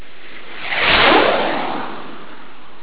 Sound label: jet